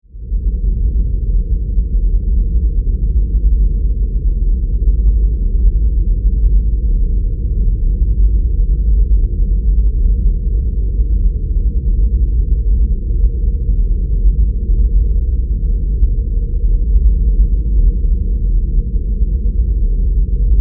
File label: Drone, Rumble, Deep, Space, Scifi; 5.1